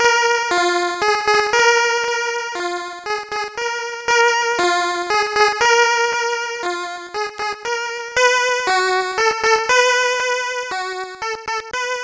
Ditty; NBA in the Zone 1999; gbc

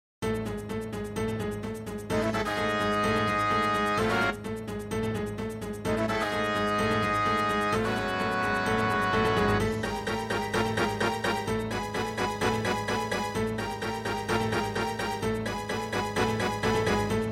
MIDI Boss; Star Merc

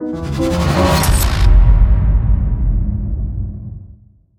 Sting; Void Bastards

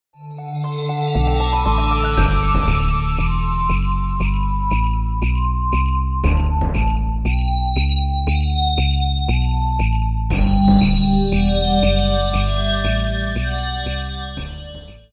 Level Complete; Shipwreckers; PS1; Arctic

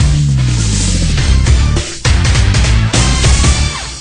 Touchdown!

